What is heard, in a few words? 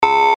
alarm